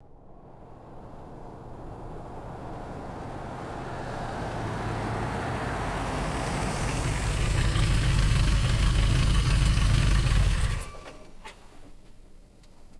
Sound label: vehicle approaches stops and switches off; vehicles